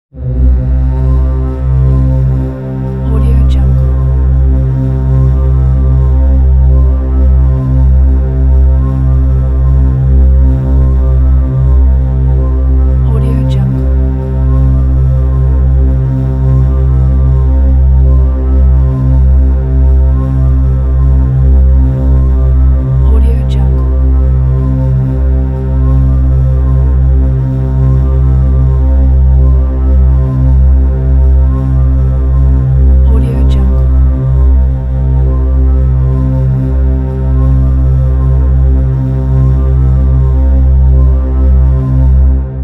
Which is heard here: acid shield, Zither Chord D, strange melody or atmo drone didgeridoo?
atmo drone didgeridoo